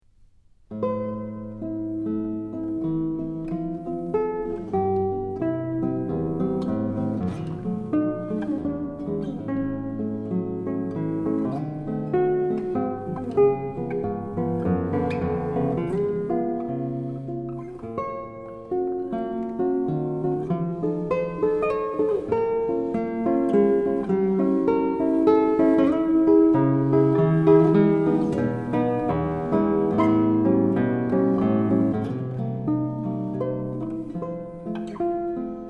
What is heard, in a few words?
Prelude A minor (Largo); Tarrega